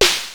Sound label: snare; Ableton Foundation FreePacks; Foundation Drums